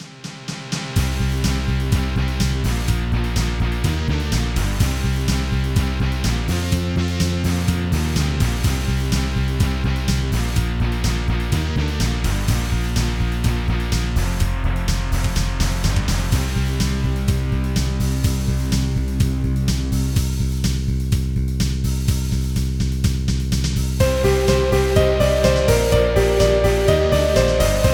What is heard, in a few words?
piano and guitar; multichannel; multimatograf15